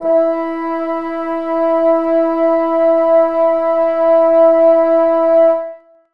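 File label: bassoons sustain e2; Sonatina Symphonic Orchestra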